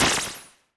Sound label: teleport fast disappear